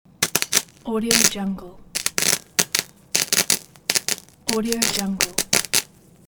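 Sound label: electric short circuit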